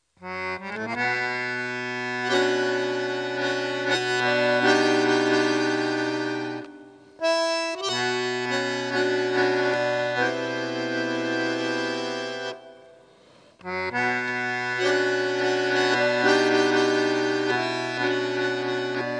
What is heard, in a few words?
Tango